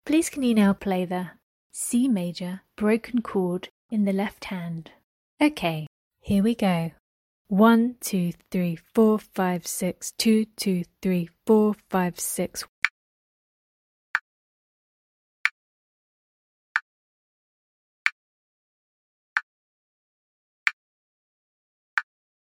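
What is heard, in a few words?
C major broken chord left hand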